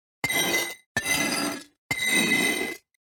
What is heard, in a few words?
grindstone